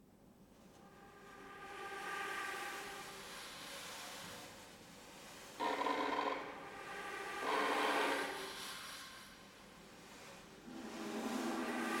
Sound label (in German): Moor Wind Baeume